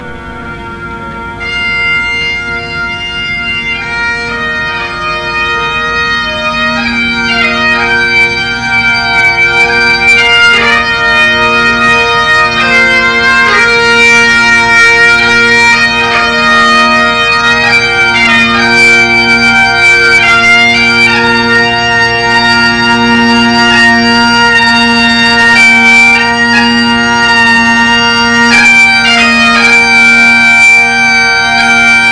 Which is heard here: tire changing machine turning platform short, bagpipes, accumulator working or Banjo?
bagpipes